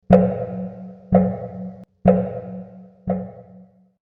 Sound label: Metal Hits Deep And Slow, impact; found sfx; Andrew Fleming